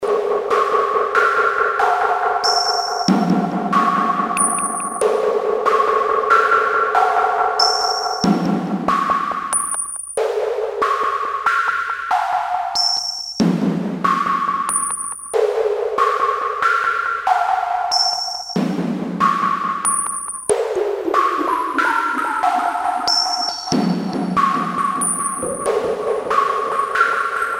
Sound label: pitch noise